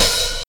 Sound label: open high hat a key